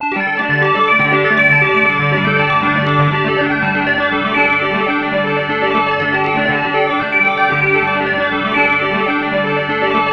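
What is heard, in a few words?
sequence pad, ethereal atmospheres; Infinite Sound; Ambient Atmospheres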